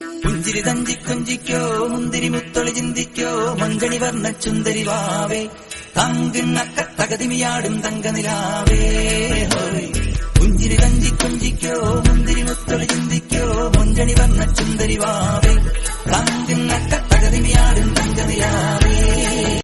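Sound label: background music, Malayalam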